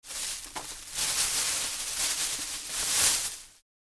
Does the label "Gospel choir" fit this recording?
No